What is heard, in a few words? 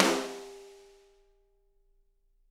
SD, Drums; Bob Clearmountain Drums I